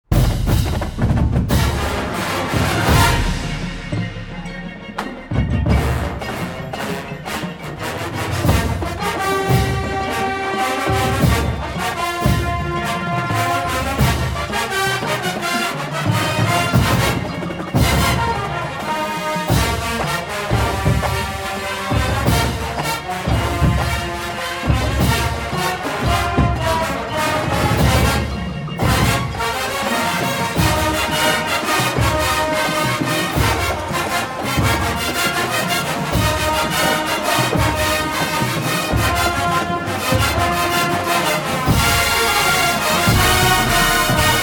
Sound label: Gangsta Jazz